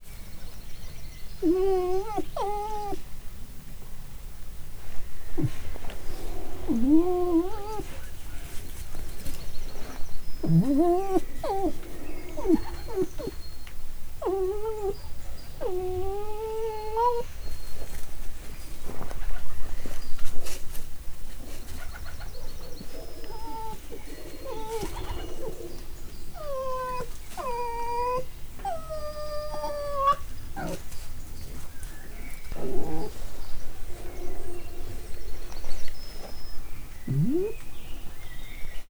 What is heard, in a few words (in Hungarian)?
simogatásért nyöszörög, vörös róka; állathangok; miskolci zoo 2009